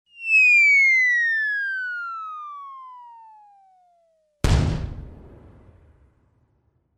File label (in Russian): dolgij svist poleta i gluhoj udar